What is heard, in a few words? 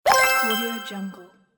melodic notice